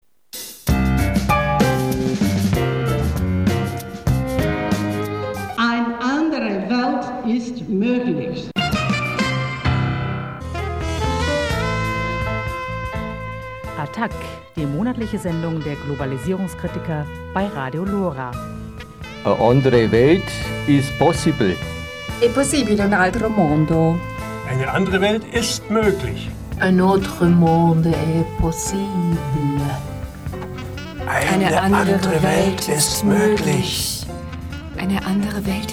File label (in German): Jingle; Attac; LoRa